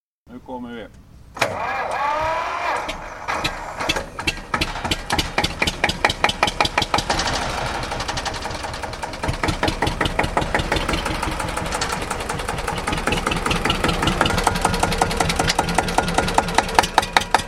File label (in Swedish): Diselross Fendt F12HL; stockholm